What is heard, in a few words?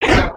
Run, creatures organic